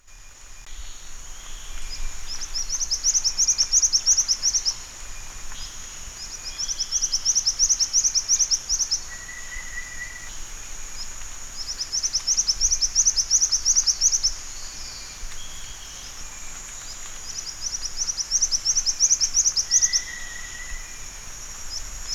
jingjing batu

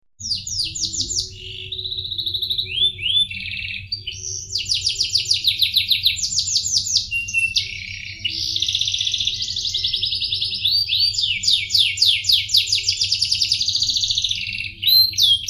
audio kenari nagasari

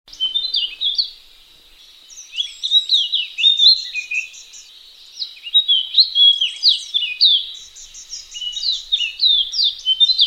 suara pleci wallacea

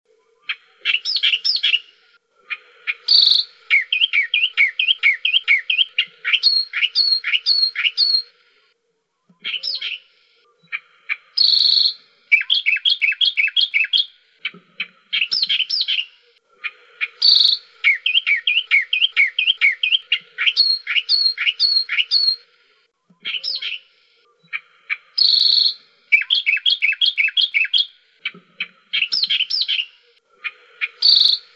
kerakbasi ramai

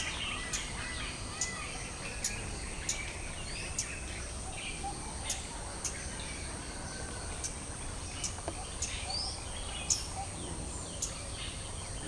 suara cabai polos di alam

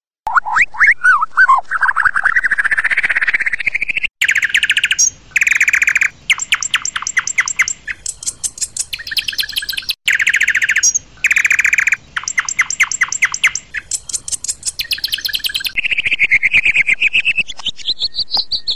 audio masteran murai batu; versi asli

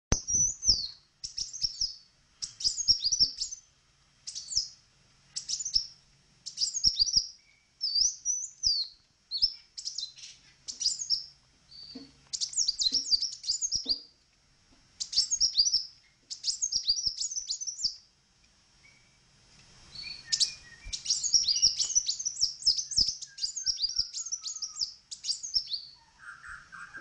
suara burung kolibri

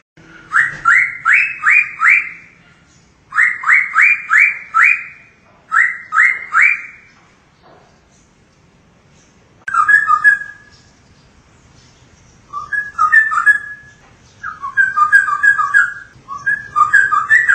suara burung cucakrowo rusak

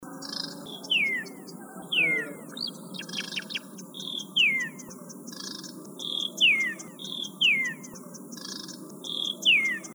tepus gelagah

